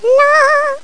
Blume; 0.09 seconds

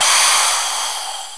cola auto

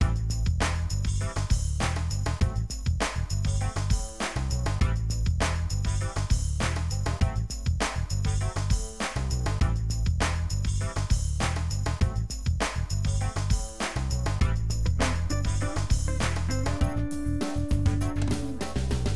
musik; juicytown

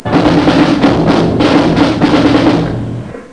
trommel

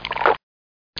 trinken

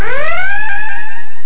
SIRENE; AUDIOSTA

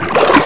plaetsch; knock out

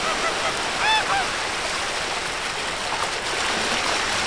FISCHE

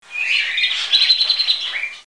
Zwitschern; TYPEMANIA

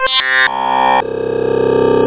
Synthi

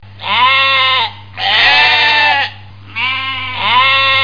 maeh

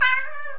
KATZE

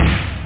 poch, snare; da capo vol1; drums

